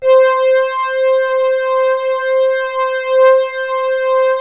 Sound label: C4, ANALO STRING; Sound Gallery - Charged